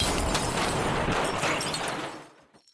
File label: crash; BALLY; TWILIGHT ZONE; Pinsound